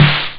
snare; OctaMED SoundStudio V1

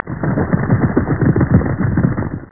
HORSES; caesar

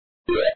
jump; magic heaven

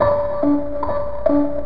Clock tick inact; NovaTerm 3.05; NovaTerm Sounds

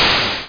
TV Power Off; iPoker 2000

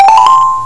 Life Up; Grunt

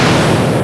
sea attack; Empire Master 2.6 Demo

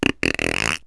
thik rip, rip tear; CD Mania

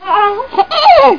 Gasp; Baby Labyrinth

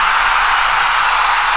Applause; Obligement9

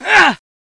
Augh!; Education Publishing Concepts

